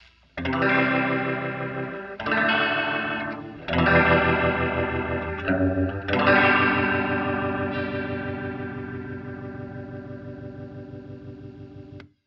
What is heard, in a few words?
guitar chords convolved output fast